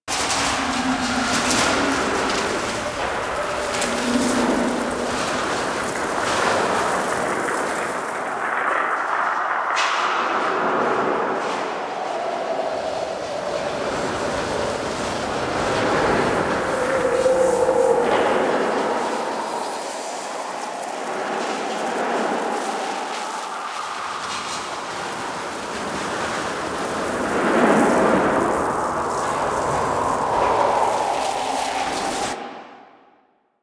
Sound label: Gas City Large Enclosed Exterior Wind Rattle; Warframe; Corpus